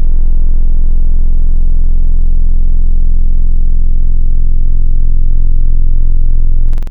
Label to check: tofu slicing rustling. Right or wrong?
Wrong